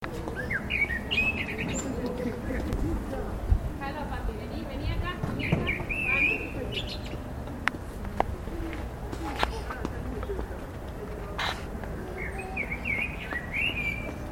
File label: Turdus Merula